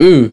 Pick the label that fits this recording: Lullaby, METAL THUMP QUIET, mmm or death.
mmm